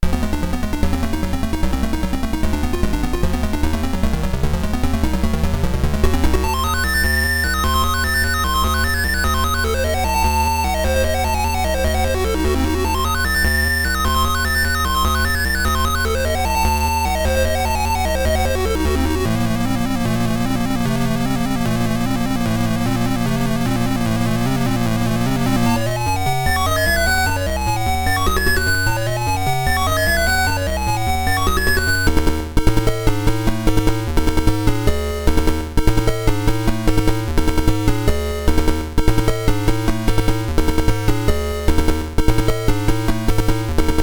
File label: Snare of Darkness (PSG); Wanderers From Ys OST; PSG Stereo